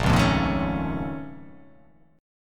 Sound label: A sharp Minor Major 11th, down